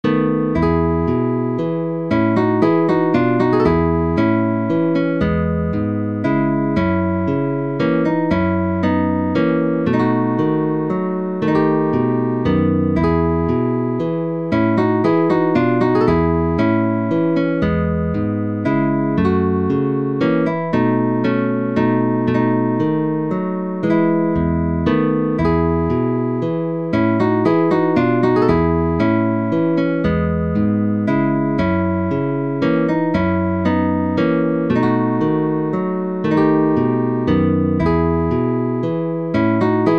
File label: Guitar Solo in E Major